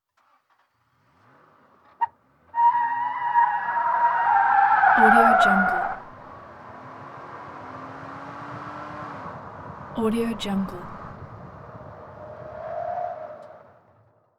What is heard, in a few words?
automobile suv accelerate perspective skid